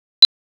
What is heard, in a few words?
edit pulse